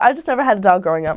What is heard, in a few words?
hiss effect